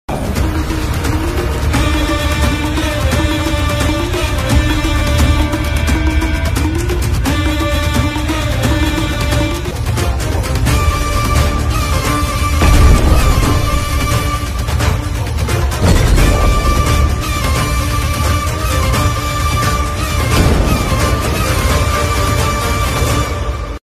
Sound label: ringtone new south bgm; tiger nageswara rao